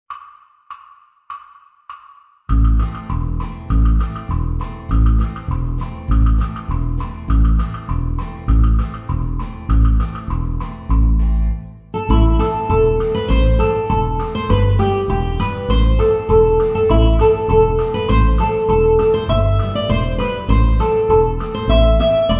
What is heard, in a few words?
Polka